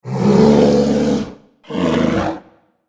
Ferocious bear roar

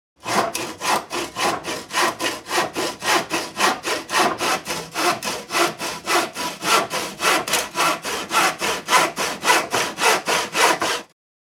Handsaw cutting wood sawing wood

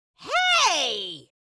Witch fantasy vocalization short shout

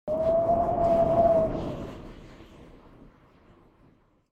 Wind howling and whistling through window

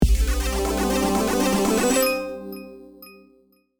Slot machine casino multiplier win boost

